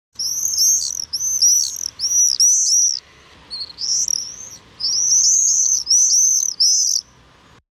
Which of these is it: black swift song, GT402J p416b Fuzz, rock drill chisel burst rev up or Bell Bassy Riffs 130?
black swift song